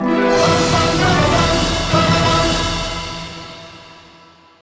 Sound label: Goal Fanfare; Pirates of the Carburetor